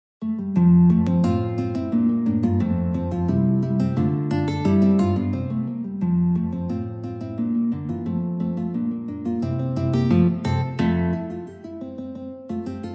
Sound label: Hornpipe, Trios; Sheffield